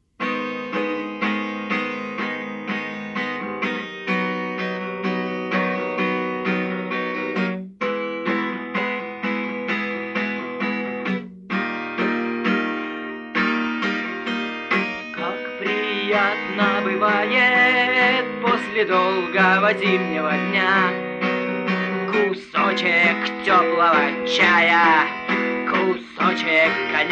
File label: unplugged